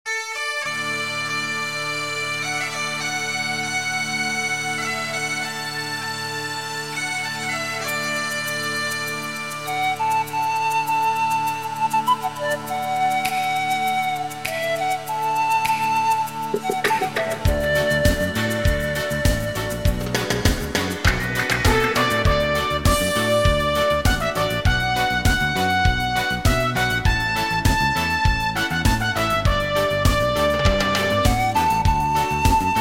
Bag Pipe Reggae

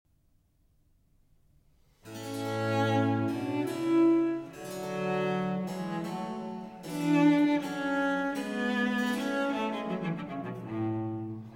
Sonata For Cello and Continuo in B Flat; Vivaldi; R.45

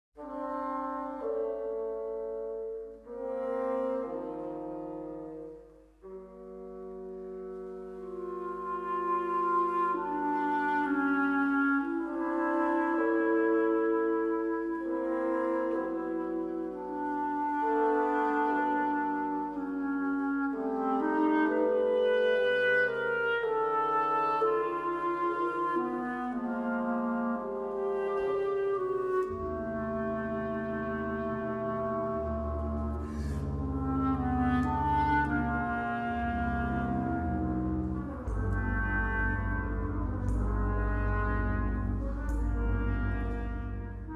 Croatian Chamber Orchestra, Clarinet Concerto; Op. 186

